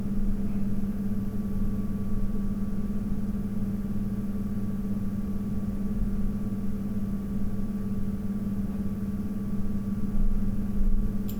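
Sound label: Pull 1000 RPM, fan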